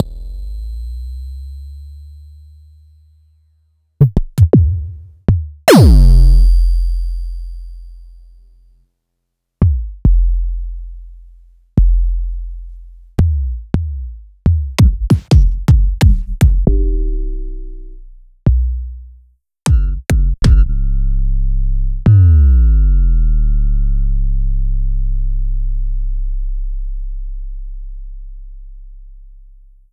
Elektron MachineDrum SPS1 MKII

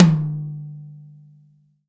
tom, Real Drum Kit, funk kit

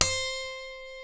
D, funk guitars

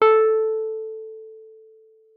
WUF A4, WURLITZER; Xsample VOL-1

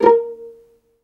violin; ILIO; Synclavier Strings

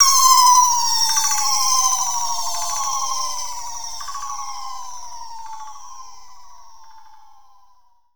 Mouse Trap; Zero-G; Soundclash Nu Dance Electro; Acidized Wavs; FX Atmospheres

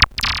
Lounge Single Drum Hitz

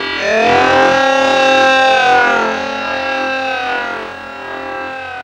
FX, Drum & Bass

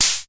HHO, UDS

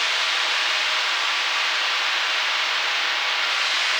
sparse soundscape samples; ReLooped

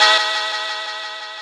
SYN, 175 BPM, Drum & Bass; Ueberschall; Drum & Bass Flash Trackz

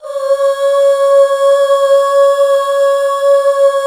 HAL UUHH, HAL Back Uuh Fem

